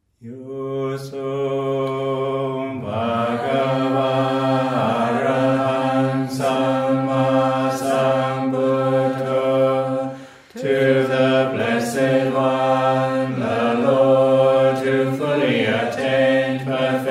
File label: Morning Chanting in Pali and English